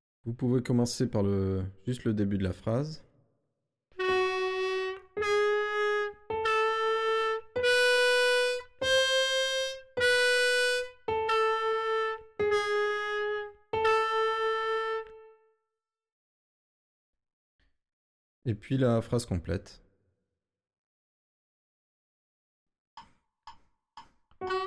piano

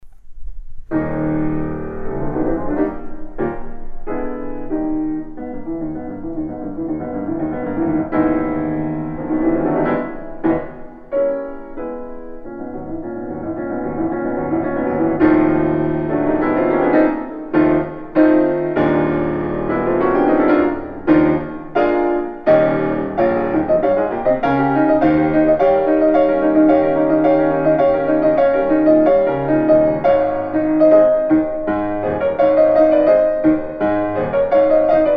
analog

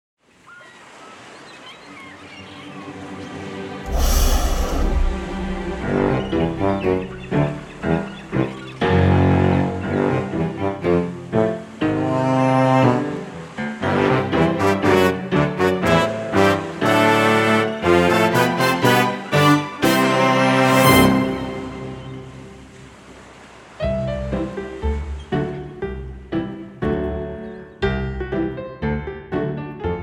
audio book without narration; Blue Whale Poo

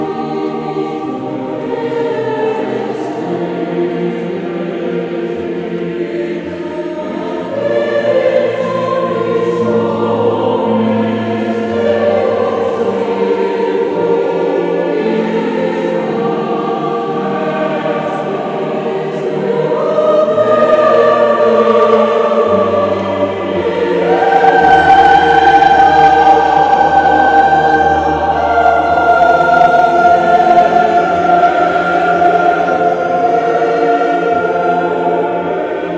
Oboe

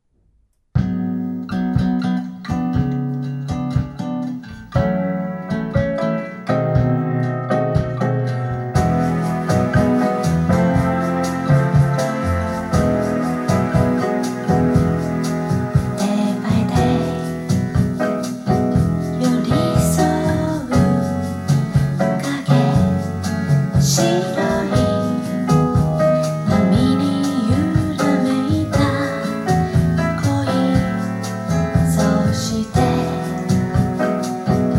tube

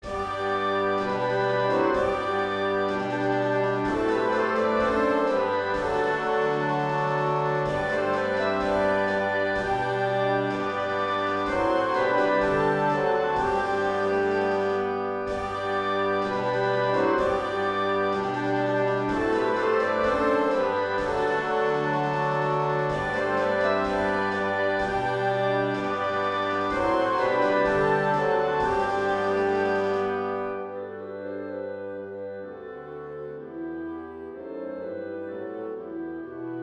band

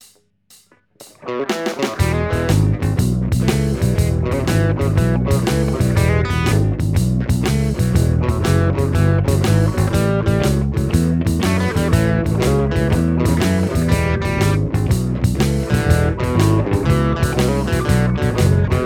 E Blues

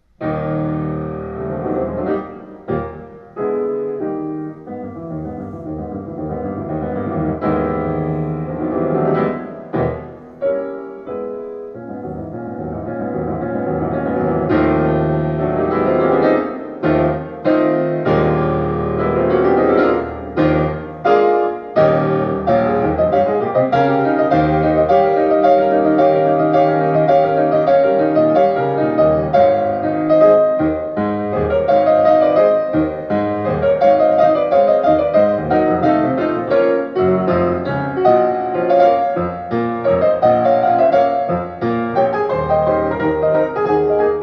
tube NF OFF

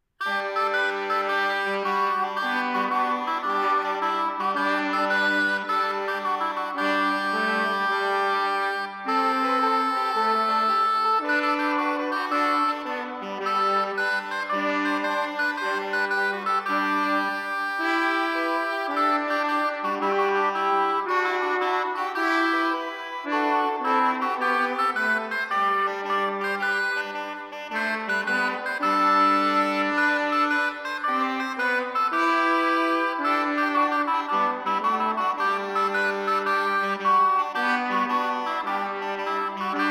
Tuba; Gallicalis

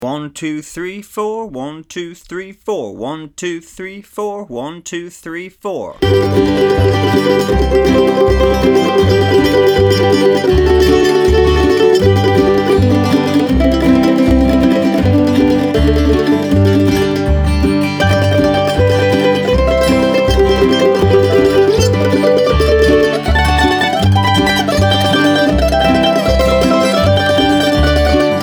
Bluegrass Doublestops Beginner Mandolin 80 BPM

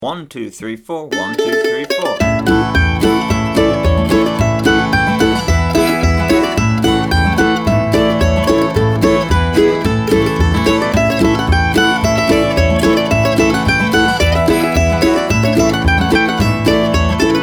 Old Joe Clark Mandolin 110 BPM

